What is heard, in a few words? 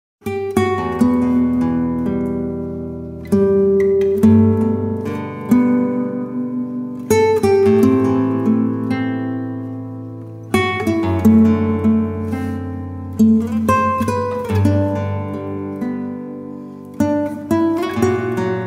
Peaceful Guitar Sketches